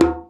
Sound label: djembe; Univers Sons; Basicussions